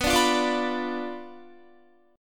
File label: B Diminished B down Guitar; strummed; tuning 4F,4A,5C,5G,5C,6E